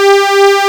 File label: BRIGHT WAVE; AKAI S6000 CD-ROM - Volume 1; SAMPLE WAVE MIXING 1; 128 PRO SYN